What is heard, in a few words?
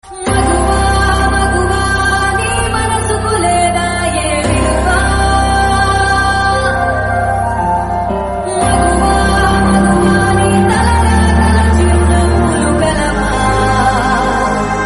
Sad Version Bgm